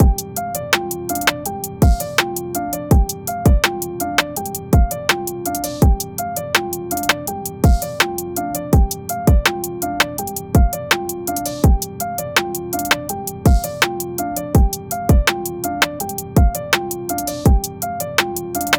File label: Hip Hop 165 BPM Cmaj